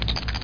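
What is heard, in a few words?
com button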